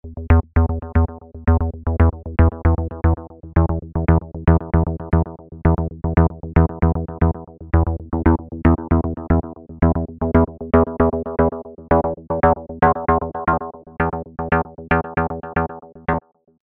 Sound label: Bass